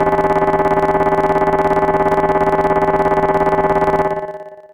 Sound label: Alarm Call DX100 F1